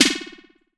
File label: Snare Delay, TR-606 Bent Samples; Sonic Foundry (Sony Creative Software); The Electronic Music Manuscript Collection; Battery 3 Sample Kits